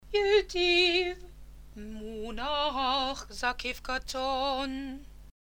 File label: yetiv; Yetiv (Haftarah); Torah